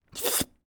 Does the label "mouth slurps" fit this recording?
Yes